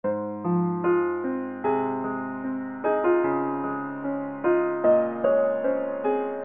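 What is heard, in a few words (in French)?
Piano avec vélocités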